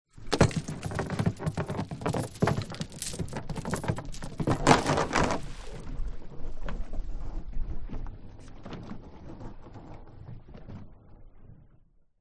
transport boat anchor small on chain throw overboard rope movement hit bottom; fiberglass